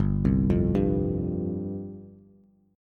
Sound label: G 7th Suspended 4th G down Bass